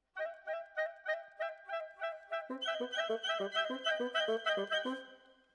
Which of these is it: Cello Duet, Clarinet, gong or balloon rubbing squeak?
Clarinet